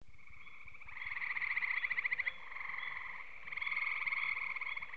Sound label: eastern gray tree